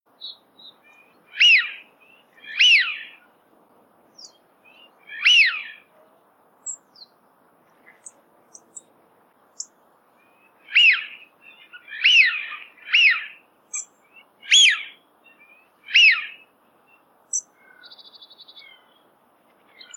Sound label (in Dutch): smient roep